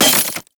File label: Melee Impact Glass; Warframe; Weapons